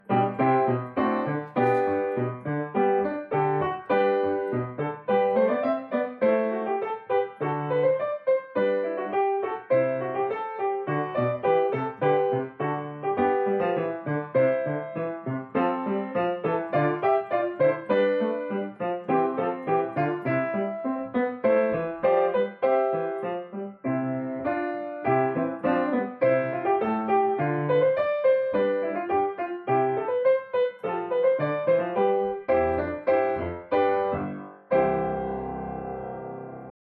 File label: PIANO ACCOMP; practice recording; Sing For Joy; Audition; North Carolina Elementary Honors Chorus